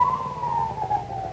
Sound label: FX, Futurist Drum & Bass